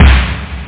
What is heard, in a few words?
snare drum; snare samples